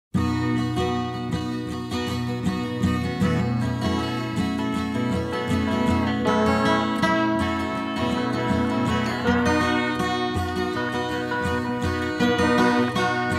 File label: Mandolin